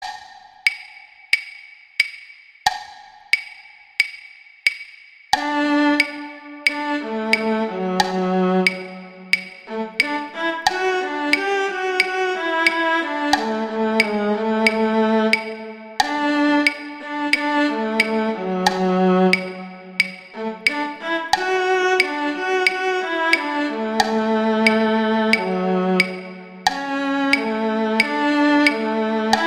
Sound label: Cello I; Duet 3; Mozart; Duets